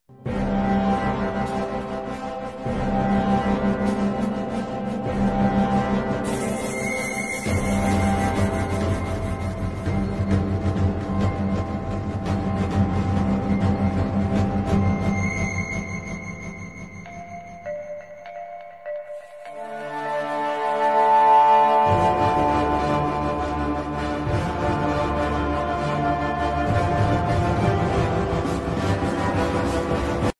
tamil bgm ringtone; trending tamil; rayan movie

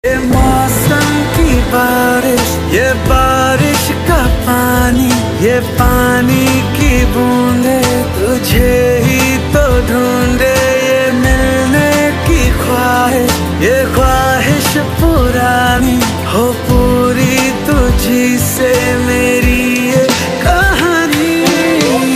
song ringtone; half girlfriend; arjun kapoor; shradhaa kapoor

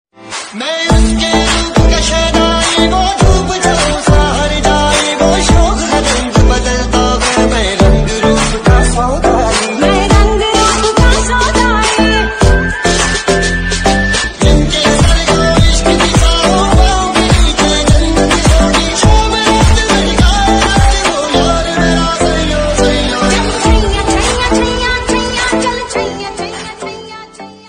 ringtone, bgm ringtones

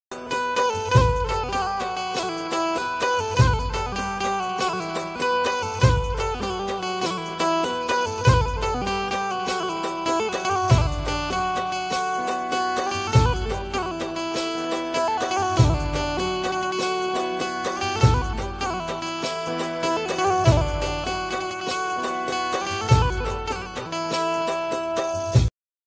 gujarati ringtone love ringtone

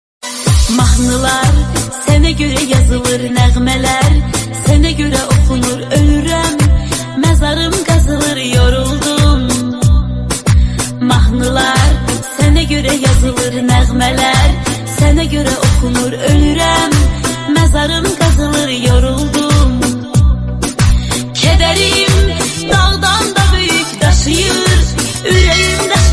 arabic ring

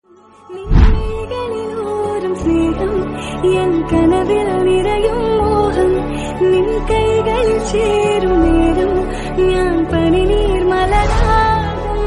malayalam ringtone; kgf

